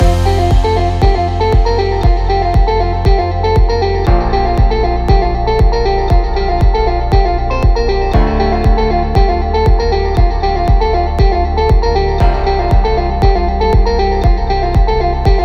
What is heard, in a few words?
Light Rock Loop; hi tech